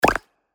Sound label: Confirm